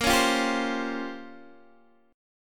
A sharp Minor 6th Add 9th, Guitar, Open D

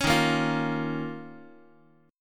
Eb 7th Suspended 2nd, Guitar; Eb7sus2 strummed; JGuitar

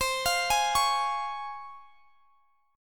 Db Major C; Db/C; arpeggiated

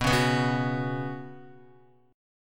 B Suspended 2nd B down Guitar, Bsus2 chord; strummed; JGuitar

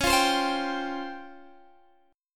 Db Major Sharp 11th, Guitar Open D

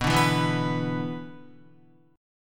B-Minor Double Flat 5th, Guitar; Bmbb5 strummed; JGuitar

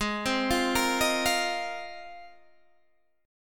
Ab 6th Add 9th down Guitar Open G

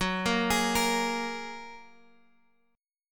Gb Major Add 9th, down, Guitar, Open G

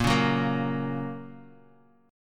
Bb Minor Sharp 5th Bb down Guitar Half Step Down

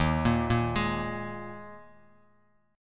Eb Suspended 4th Eb, Bass Standard; Ebsus4 arpeggiated; JGuitar

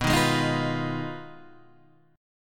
B 6th Flat 5th B down Guitar DADGAD

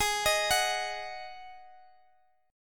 Eb Minor Double Flat 5th Ab

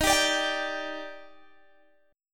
D sharp Major 7th, Guitar Open G

